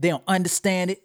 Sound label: Double Vocal F-